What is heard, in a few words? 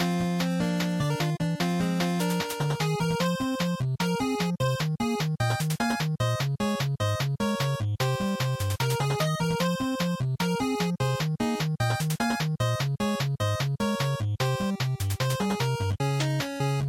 Shooter Stage Loop, bgm